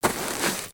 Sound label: Footstep; Lego; Strombie; creatures organic